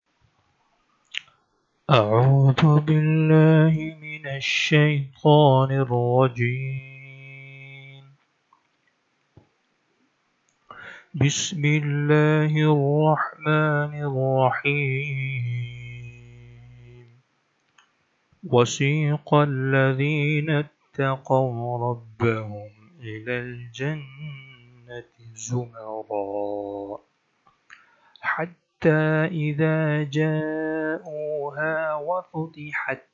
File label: quraan recitation